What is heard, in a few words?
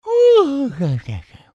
yawn; paper mario